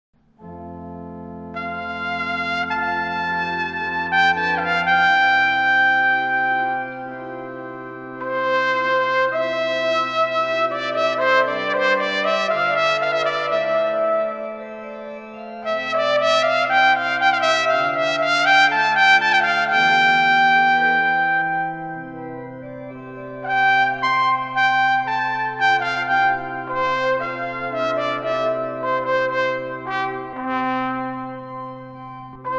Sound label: Adagio